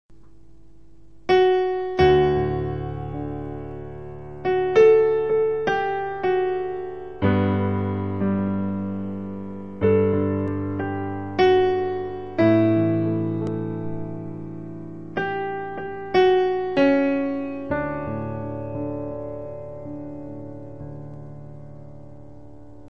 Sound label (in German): klavier intro